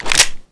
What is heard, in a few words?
357 close